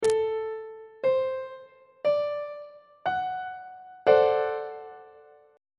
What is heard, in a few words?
e double flat dominant 7th 2nd inversion on treble clef